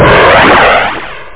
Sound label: Bazooka; wormnoiz